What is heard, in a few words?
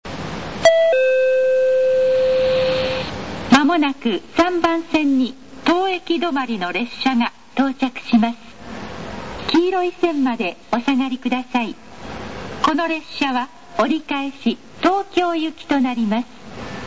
sekkin; kaihinmakuhari